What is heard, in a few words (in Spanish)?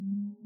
vaca; st-gallen; gais; choir of species